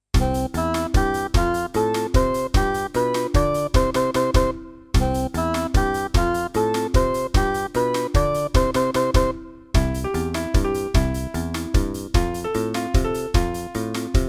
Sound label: Instrumental